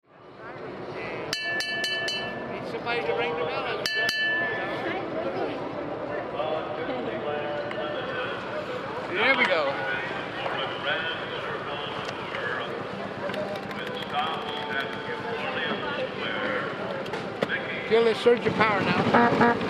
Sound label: Fire Truck